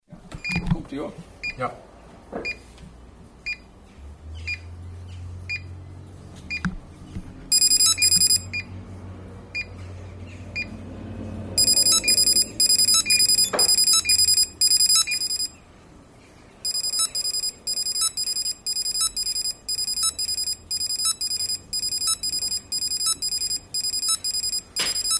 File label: work various beeps; fieldrecs